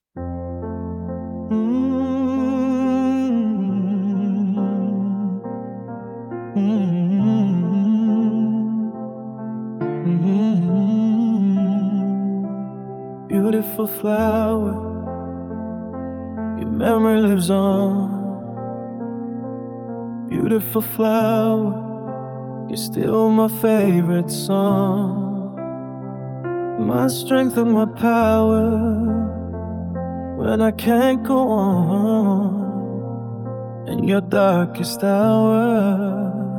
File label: piano version